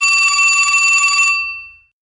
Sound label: Vintage Telephone